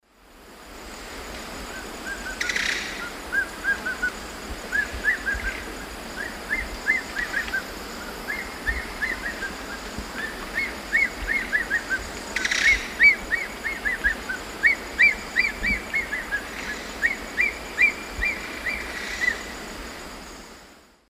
Spizaetus ornatus, display flight song; S. America; Eastern Lowlands

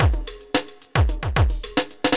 evil beats 110

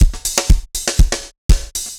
dance floor tight 120, loops; 120bpm

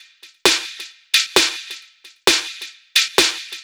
Snare Loop, Dance Beats

